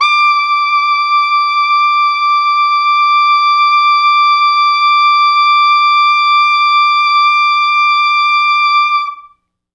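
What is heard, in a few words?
tenor sax susvf ext. range, D5; East West; Quantum Leap Horns Sax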